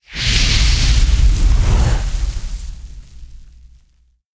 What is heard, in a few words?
Fire Rain; Fate The Cursed King; spells